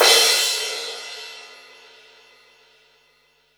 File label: crash L; Zero-G; Chemical Beats; CRASH ZOO